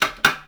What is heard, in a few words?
on off, Switch FX; Masterbits